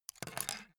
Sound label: pen put down